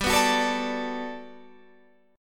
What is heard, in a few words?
G Major 9th, Guitar, Standard; GM9 strummed; JGuitar